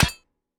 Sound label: metallic headshot